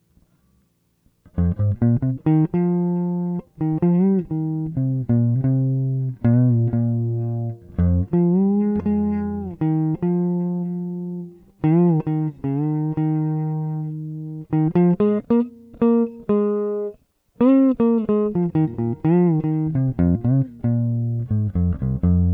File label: fretless